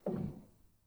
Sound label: rel, Grand